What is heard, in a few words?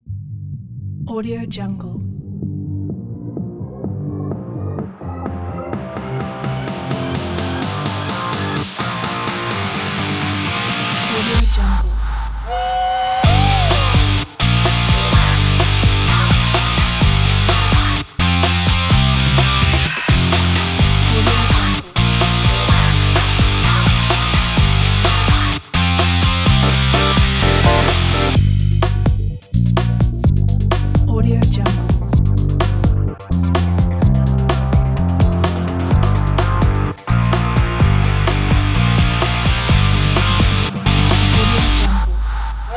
adrenaline sports pack